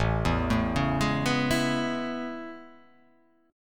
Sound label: A Major 9th A